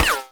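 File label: ricochet, weapons; Dystopia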